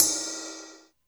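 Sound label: Cym Flange Rock, Drum Machines Alesis D4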